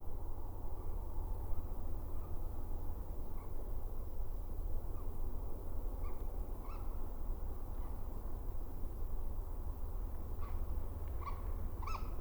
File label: Eurasian Woodcock